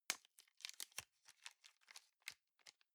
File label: plastic bag small clasp open